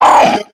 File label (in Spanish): Grito de Gulpin